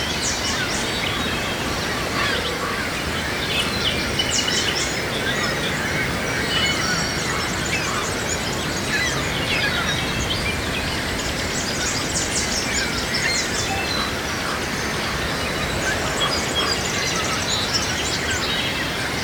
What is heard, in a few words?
balloon pop